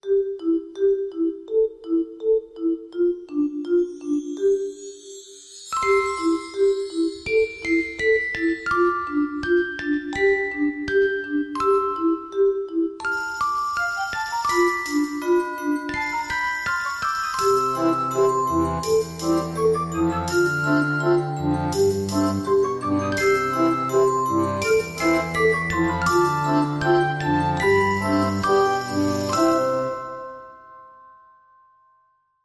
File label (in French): Danse du bebe; Cirque